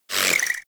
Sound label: Pistol Proj By; Warframe; Tenno; Guandao